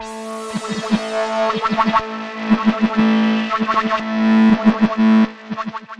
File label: Synth Sting; musicradar